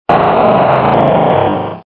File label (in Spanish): Grito de Groudon